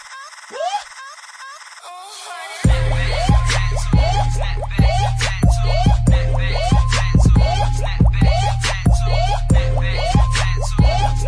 Instrumental Beat

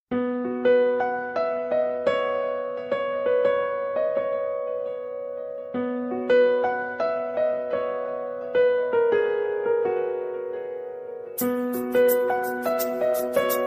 Piano Tone

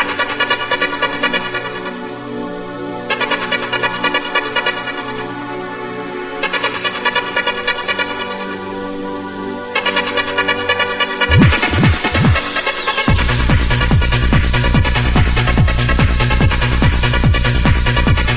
trance feel

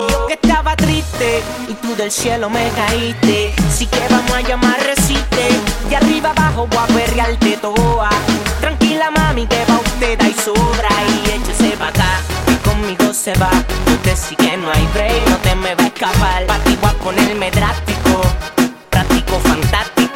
movimiento electronic, reggae

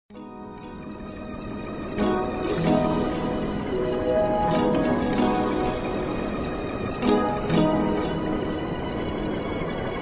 g-funk instrumental

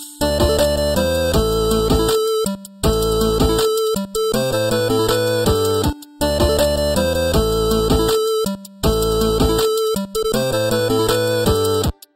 8 Bit

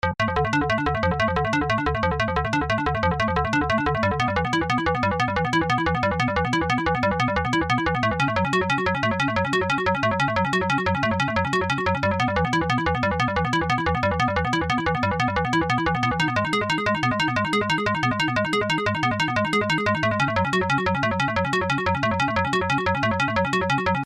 Crazy Blips

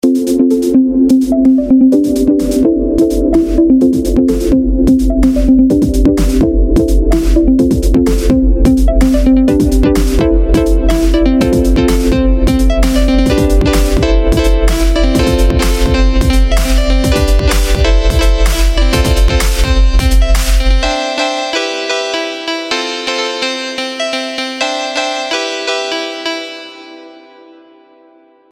Trance inspiration

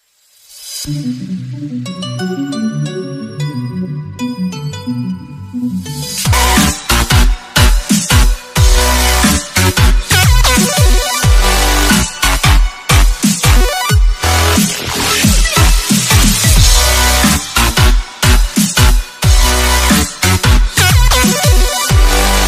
Haunted dubstep

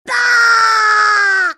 baaah; games; ringtones

